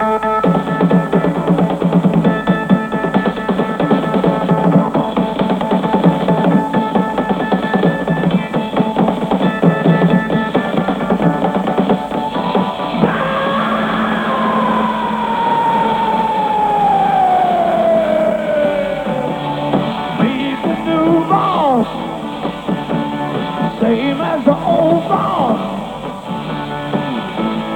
Drum Solo; Radio Broadcast In Paris

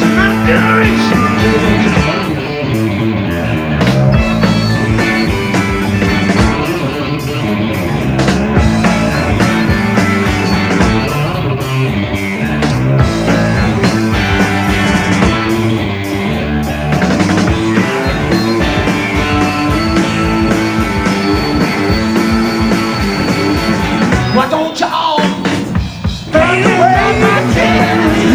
Bass Solos; Live In Essen Germany - March 28 1981 - Rockpalast

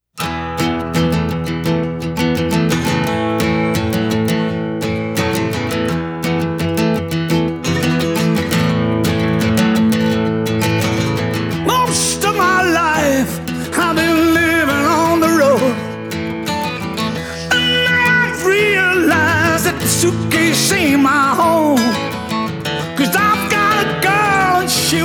Acoustic; Japan

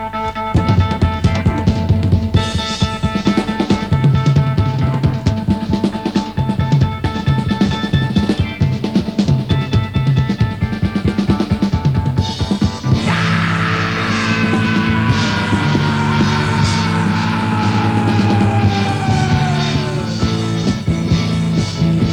Drum Solo; Charlton; May 18, 1974

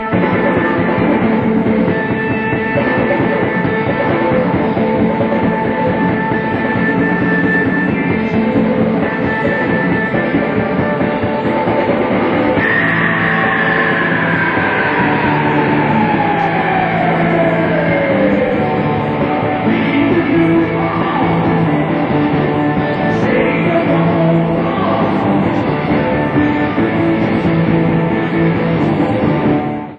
Drum Solo; Rainbow Theatre London; 6th November 1971

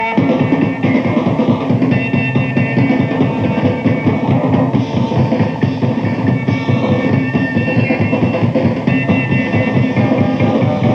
Drum Solo; Gutter Punks At A Warehouse; New Orleans, LA; November 29, 1971

